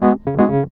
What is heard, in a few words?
E.PIANO, KEYS